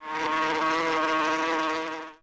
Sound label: buzz; DAoC; Toa